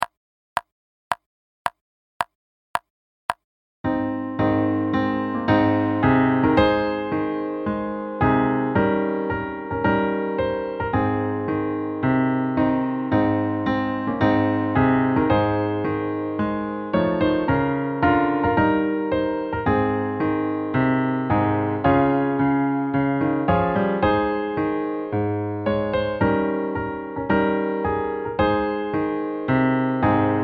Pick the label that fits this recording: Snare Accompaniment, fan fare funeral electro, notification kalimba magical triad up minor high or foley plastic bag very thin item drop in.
Snare Accompaniment